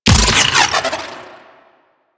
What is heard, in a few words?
Projectile Launch; Redline; Warframe; Runner